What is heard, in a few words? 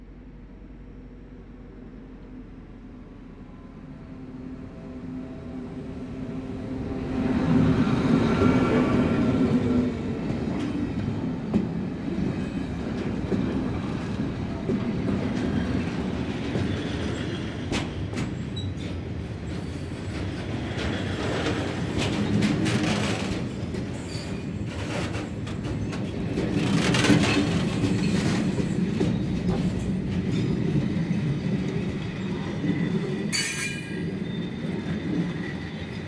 train station squeal; best service Blue Box